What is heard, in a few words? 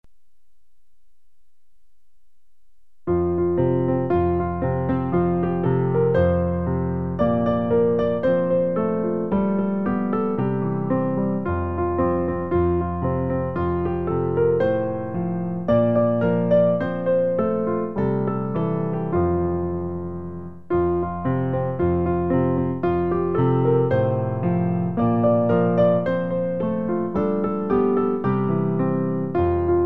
piano; Une porte seulement; Pour accompagner le recueil Louez Dieu.